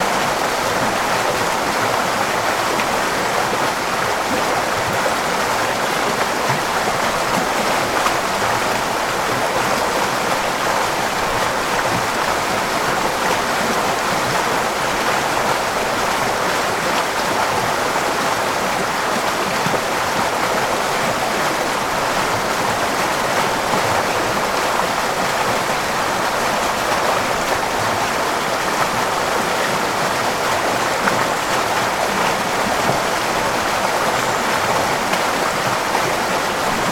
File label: water river fast; sound effects one